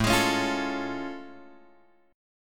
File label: A Augmented Major 7th A; A+M7 Chord {5 X 6 6 6 5} strummed